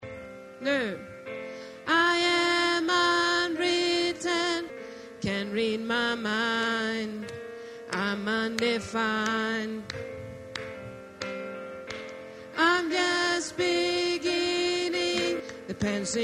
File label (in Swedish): sopran; stammor